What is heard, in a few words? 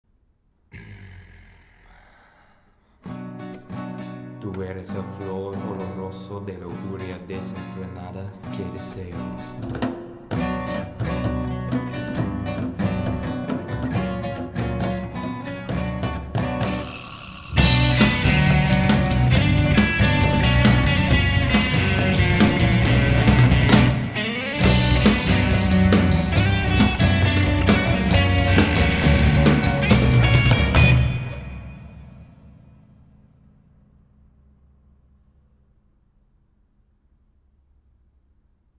Love Songs high speed tank fx; dutchoven